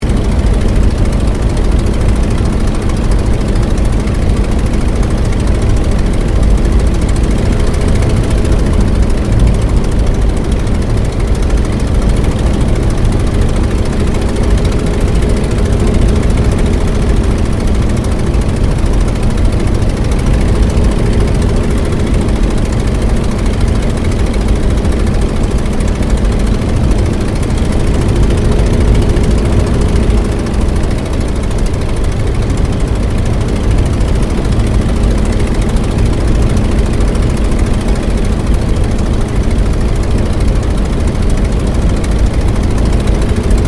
AIRCRAFT RYAN PT22 FLYING INTERIOR; Airplanes; Vehicles; 1942

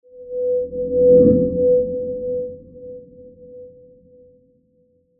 Long Horror Transition; Scary Soundscapes; Crafted Signals

